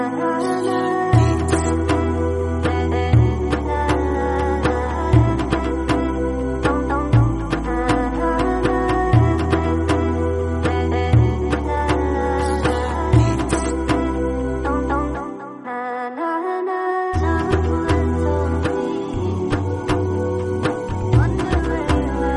nasheed instrumental

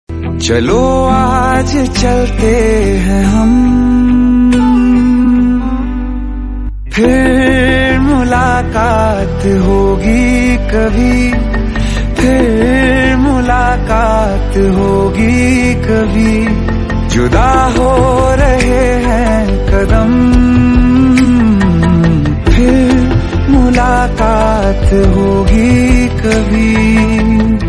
hindi

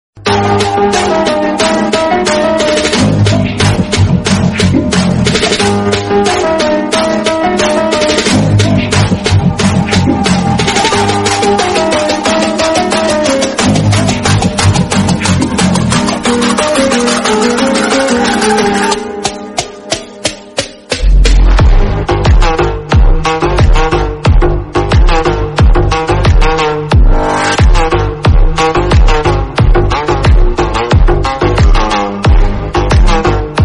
remix, bgm

